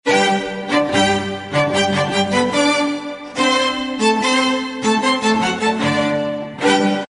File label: mozart nachtmuzik allegro strings